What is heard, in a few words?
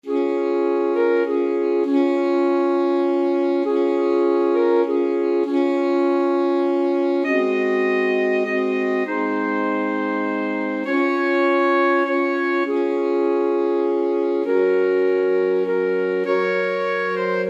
silent night, alto sax quartet